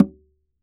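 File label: ambience, Drums Percussion Congas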